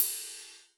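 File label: Cymbal Flat Ride, Drum Machines, Alesis D4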